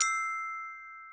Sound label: gloken